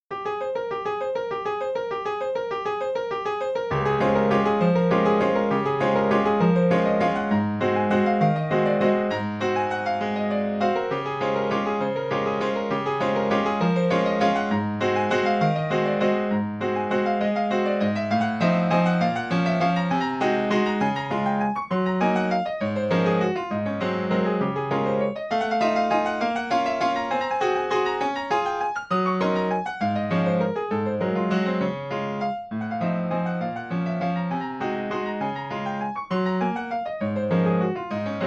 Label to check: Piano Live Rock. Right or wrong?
Right